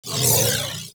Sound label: Crewship Turret Proj; Warframe; RailJack; Corpus Crew Ship